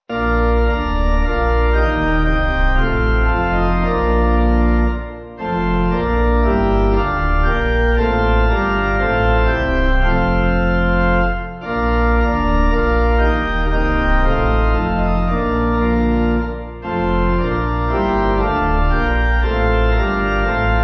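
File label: pipe organ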